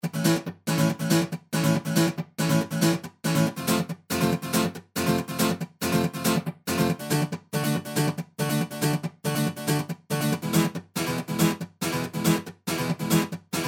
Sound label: guitar